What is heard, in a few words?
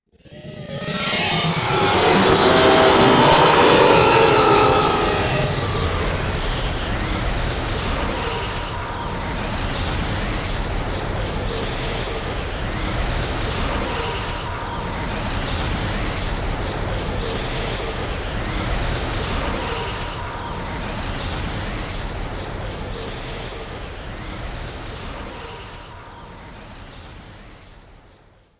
Boss Collapse